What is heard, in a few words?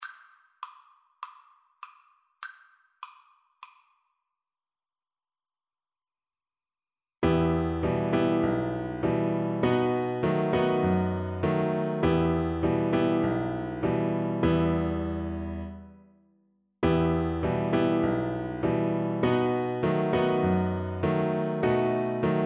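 VLC karaoke, Cello; aint gonna let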